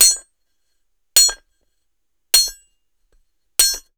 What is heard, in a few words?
hammer Metal; tools Bangs